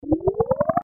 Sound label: ufo takeoff